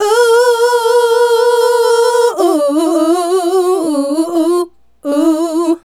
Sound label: Female Group, Vocal